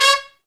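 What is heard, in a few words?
BRS TPTST, brass trumpet stabs; Roland L-CD702; BRS Tps Stabs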